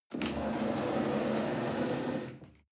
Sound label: chair wood slide on floor soft